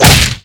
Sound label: hit; slender; yakuza; kiryu